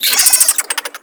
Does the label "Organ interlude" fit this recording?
No